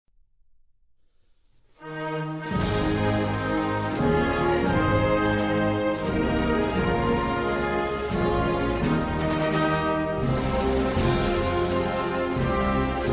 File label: National Anthem (Inno Nazionale) Kazakhstan